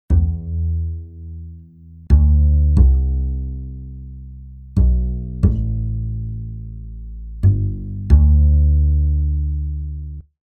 Tranquil Nocturne; admiralbob77

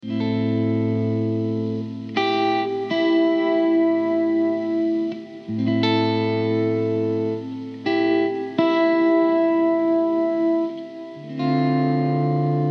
Am85 GTR Volume Pedal Motif

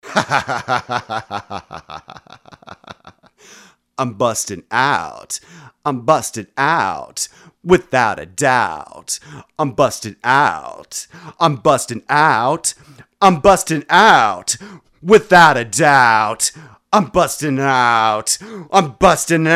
130 BPM

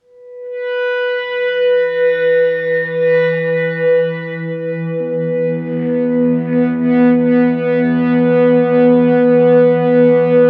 Em70 Stormclouds

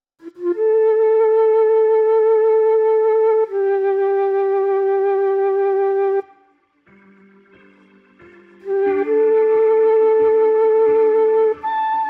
Gm90 Stereo MIX

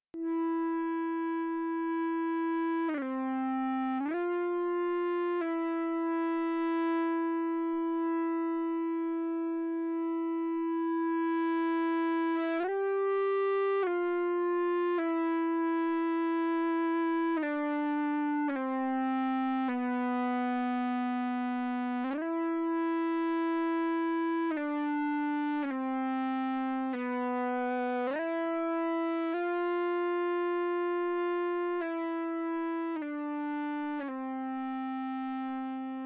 Ebow Ensemble; Mille Regretz

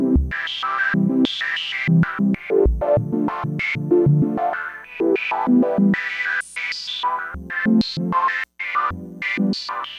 Mangling Patterns

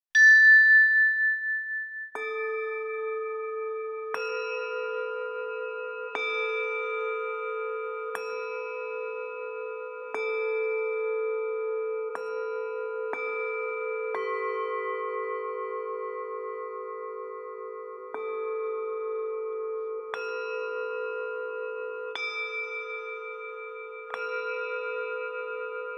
Mantra with Singing Bowls

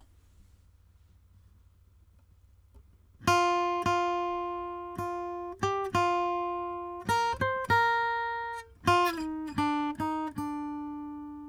acoustic guitar

